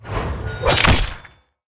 heroes brutal Slash; raelyn

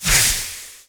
necromancer attack

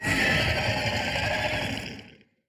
dragons enemy shadow alfa evolve scream; sfx

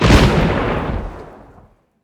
lightning

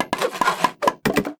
defensive Turret cast; heroes torres

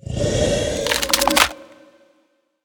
crystal serpent serpents attack

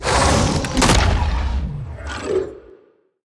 disease nova cast; heroes; bonehart

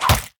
enemy tentacle attack; sfx; acolyte

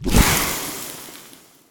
poison plant discharge con acido

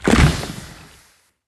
fire egg activate

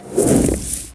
spider goddess fall

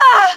scourger death

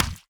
ricochet impact; heroes vesper

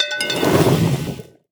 tenshi rain Of Fire start

